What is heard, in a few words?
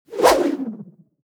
cinematic transition wind swoosh; combine cinematic wind whoosh; mixkit